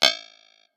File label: Digital Rattle